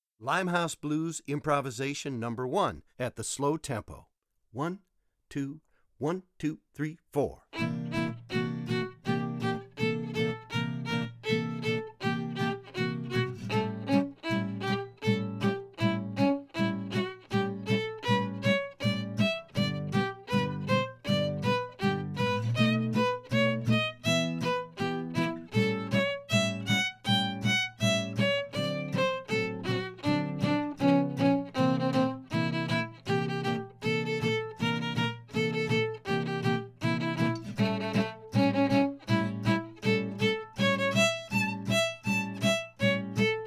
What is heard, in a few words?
Improv slo, violin swing